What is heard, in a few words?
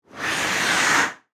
Ice Skates Blade, Scrape, Stop; Sports; Distant; Ice Skating; BlastWave